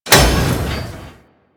Forest Piston; Ambience; Props; Warframe; Grineer Forest

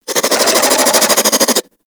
Infested Crawler Attack; Warframe

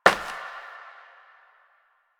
Step Glass; Warframe; Koumei

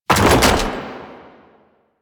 Concrete Archgun React; Warframe; Archwing

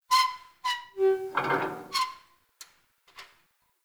Light Mirror Hang Squeak; Puzzles

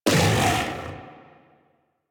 Armored Vocal Attack Short; Enemies; Warframe; Kubrow

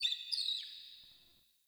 Under City Alley Critter

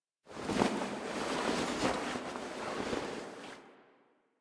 Foley Get Up; Ostron; Warframe; Quests; The New War; Narmer Outpost; Chamber Ceremony End

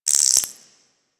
Cave Insect; Warframe; Quests; TheNewWar; Ambience; Drifter Camp; Insects

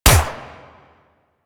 Harpoon Gun Shot; Warframe; Sharkwing; Grineer

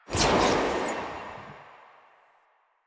Dagger Proj Loop Start; Duviri; Incarnon Adapters; Incarnon Okina Prime; Weapons

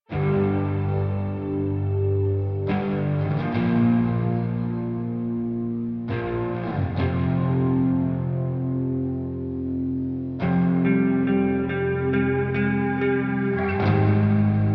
Hub Amb Cue, Music; Dynamic Music; 1999

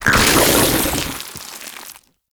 Infested Drop Pod Spawn Enemy; Warframe

